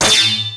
g#3, Crazzy Perc Set, CASIO FZ-1; Retro Sampler

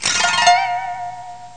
song; Amiga Soundtracker sample

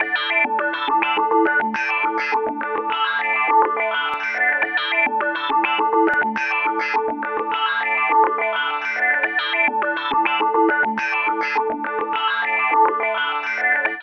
TABLA SEQ D; SYNTH; AMG; Global Trance Mission VOL-2

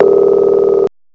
DIAL; EFFECTS; M8; CMI Factory

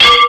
PERC METAL; Big Beat Samples & More; AKAI

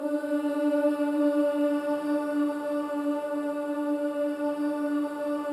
BOYS OOS; Spectrasonics; Symphony of Voices

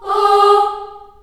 G#3 CHS AH-L, CHILD AHS, Choir; Acoustic & Synth; AKAI; USB Soundscan vol.28

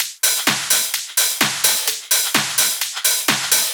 Loops 128BPM, Drumloops

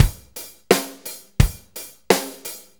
4-4 Loop, Drum Loops, Full Kit Loops; Drum Tools

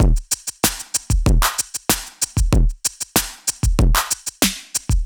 Electro Drums 95; musicradar

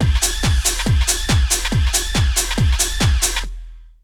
Essential beats

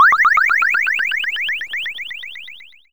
sci fi; Masterbits - Switch FX

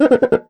cuica; Best Service ProSamples vol.52 - World Instruments 2; MIXED PERCUSSION

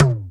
JD800 Internal Drums, Roland JD800

